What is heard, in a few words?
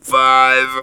Talk Box high